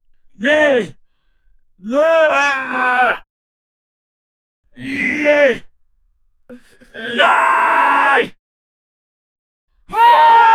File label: man yelling in anger